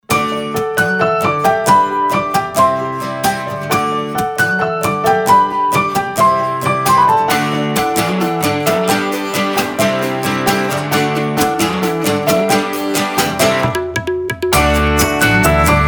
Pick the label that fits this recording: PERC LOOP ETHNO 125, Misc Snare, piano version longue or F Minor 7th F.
piano version longue